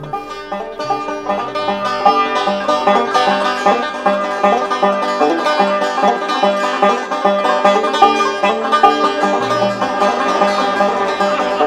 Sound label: Marching Drill